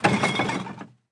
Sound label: Ambience Trash; Subway Surfers City; Android, iOS